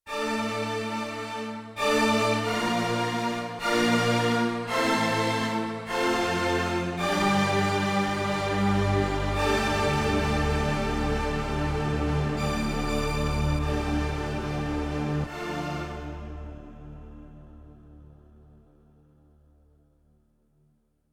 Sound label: Orchestra, Yamaha TX16W; Combo Performance 1; MULU-Orchestra